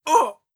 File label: damage grunt male